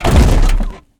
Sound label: robot footsteps; BluezoneCorp; Soundwave - Robot